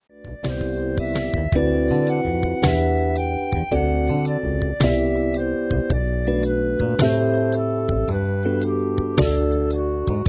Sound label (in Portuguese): escala maior